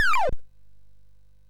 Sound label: sid MOS6581R2